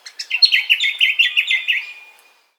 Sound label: amb bird call